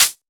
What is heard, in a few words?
Close Hi Hat, Dubstep Cymbals; One-Shots; Drums; Lenticular; Ghosthack; Ghosthack - AC2024 - Day 13 - Dubstep Sounds 2025